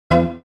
error

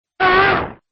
the sound lizalfos make in ocarina of time

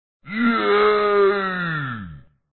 yay

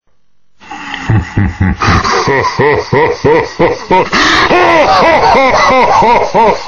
laugh

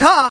car

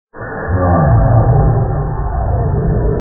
failure; vapesquared

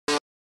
first note

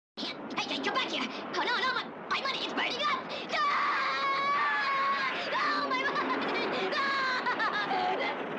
burning money

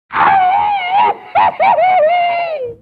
yell

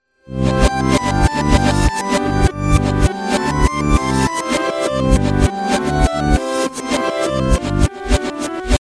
right backing, reverse

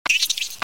bomb plant

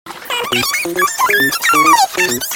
smash

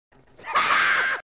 HAH

